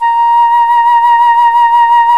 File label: FLT FLUTE, Flt vib; Roland L-CDX-03 Disk 1